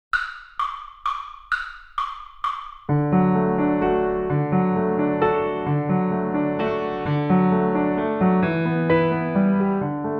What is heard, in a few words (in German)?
Bärenwalzer Playalong